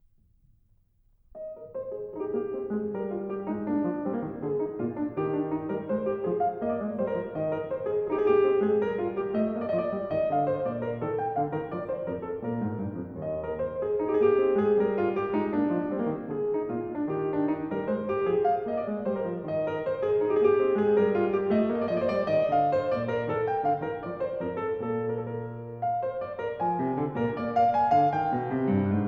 Gavotte, KLAVIER; French Suite #4 In E Flat; BWV 815; BACH

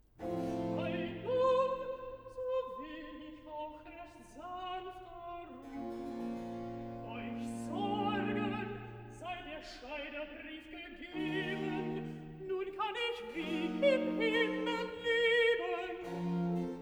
Recitativo Alto; vocal; BWV138; Ei nun!; BACH

